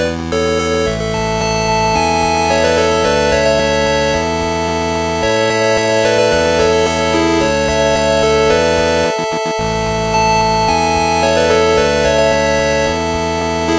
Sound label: 8 bit